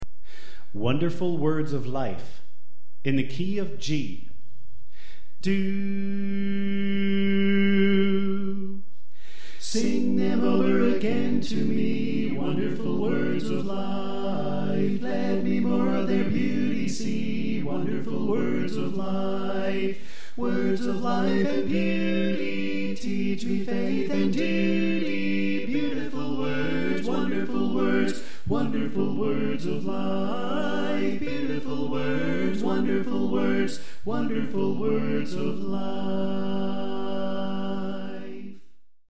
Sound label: Tenor Left